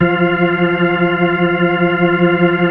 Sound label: B-3, Keyboards; Keyboards of The 60's and 70's; Full Xfade